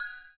CYM, Ethno Techno; ILIO - Ethno Techno

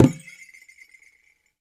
IYA ENU, World Percussion

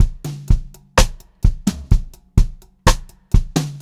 126 Pop-Rock Drumloops; 126 POP 4

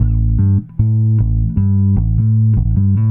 Bass, Indie Rock; Sonic Foundry (Sony Creative Software); Indie Rock Anthems; Session 12 - Spaced Out Knoll

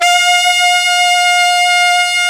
A SAX

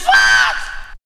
VOCALS; Ueberschall; Jam Box

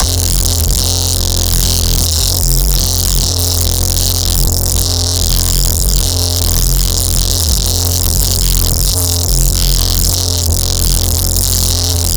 neuro filtering practice, bass

